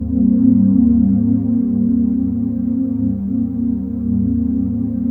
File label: TRANCPAD; Best Service; XX Large Pads; Sample CDs